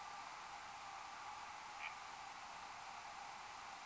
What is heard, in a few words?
Unknown squawk